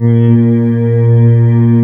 ORGAN, A#; Big Fish Audio; Synclav FM

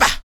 KUK, MALE PERC

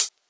Closed Hihat, House; Drums; Cymbal Stuff

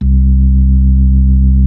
B-3, Club

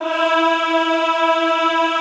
EHH E3, Classical Choir; Best Service

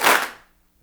CLAPS DRY, HANDCLAPS; Spectrasonics; Heart of Africa

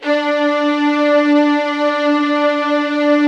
VLN D4, LOUD VIOLINS; Q Up Arts; Denny Jaeger Master Violin Library

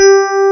E PNO G3; VOCAL ORGAN; POP CHORUS

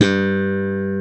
slap bass

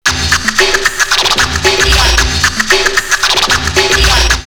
drumloops Rave 113; X-Static Goldmine; 90 s Sample CDs

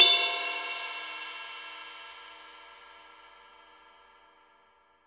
Drum Dry; East Collexion; Drum 1

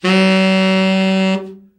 BRA TENOR OB, SAX; Best Service Gigapack 1; BR SAX 4

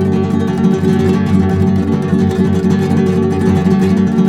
G# M, Guitars; Spectrasonics; Hans Zimmer Guitars VOL-2; FLAM TREM CH

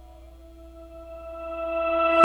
FARFISA ORGAN

